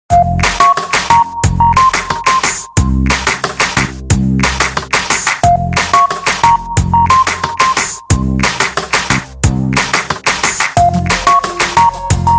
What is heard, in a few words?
xperia deep ringtone